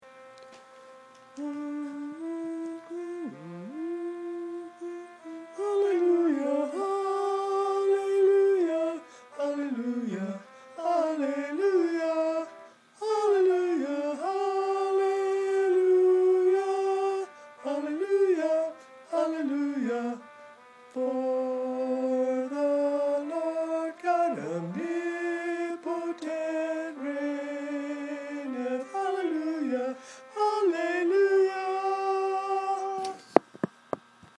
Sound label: tenor